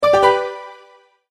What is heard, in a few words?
multimedia tone, Goodbye